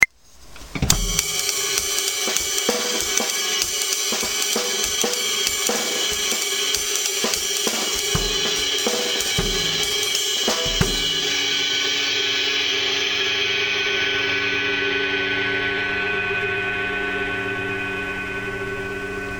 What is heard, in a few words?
mellow ride